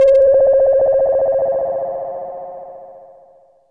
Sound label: GHOST, SYNTH FX; AMG; Global Trance Mission VOL-2